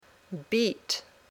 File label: beat